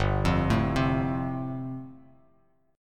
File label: G 7th Suspended 2nd Suspended 4th, Bass Standard; G7sus2sus4 arpeggiated